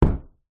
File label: impacts wood object heavy drop wood floor; zapsplat